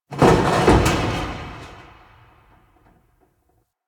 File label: Large Vehicle Creak; Ambience; Props; Warframe; NineteenNinetyNine